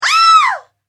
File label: British Woman Short Scream; Screaming Sound Effects; horror sound effect